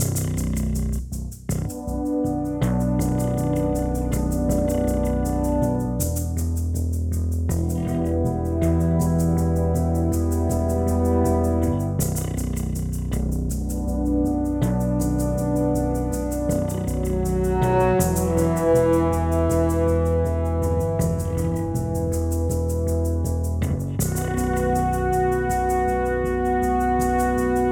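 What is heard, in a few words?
Music Layer Exploration layer; HDF Ch3 Explorartion1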